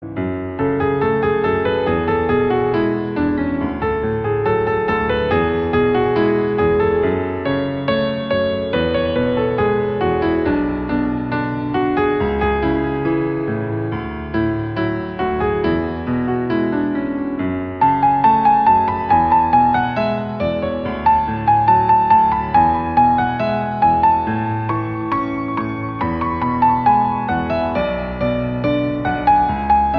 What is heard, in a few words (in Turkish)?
Duygusal Piyano